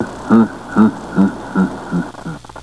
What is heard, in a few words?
laughter; monsterb